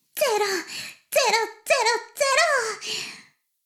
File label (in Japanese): シコシコ音声; 古き城のドラゴニート2